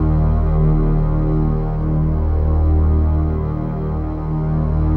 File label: sounds music; Halo Combat Evolved; Xbox